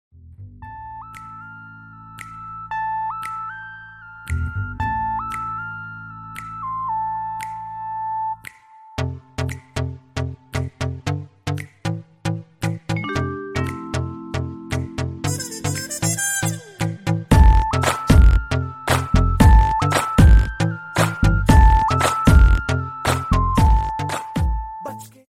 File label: new hottest tone